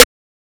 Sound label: Snare; fl studio; drumkits; dstarkels plugnb